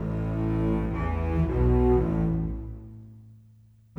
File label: Bass Cello, Orchestral; Sonic Foundry (Sony Creative Software); Orchestral VOL-2; Modern 11